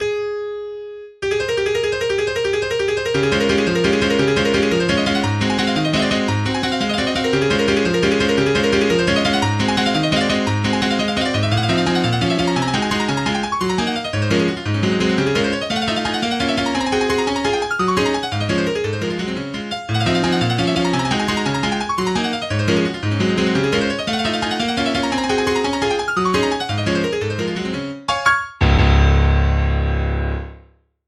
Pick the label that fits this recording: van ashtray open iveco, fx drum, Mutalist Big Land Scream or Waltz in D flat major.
Waltz in D flat major